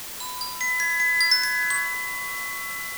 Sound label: denoising glock 10dB